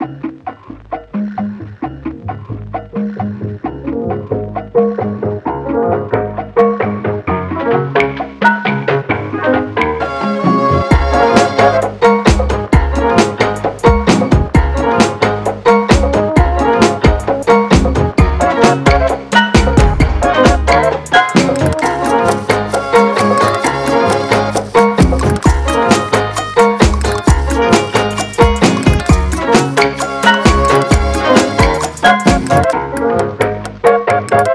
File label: cha cha groove